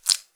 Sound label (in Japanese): 膣外射精